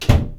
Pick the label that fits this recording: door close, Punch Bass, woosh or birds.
door close